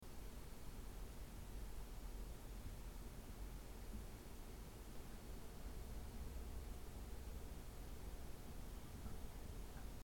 scythe 1m cfm

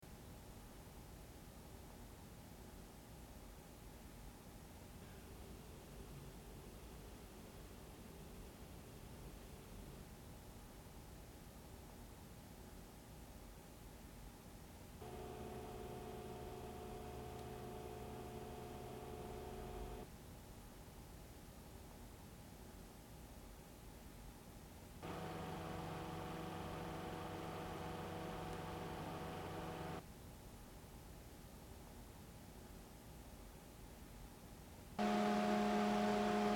acoustifan 120 1m

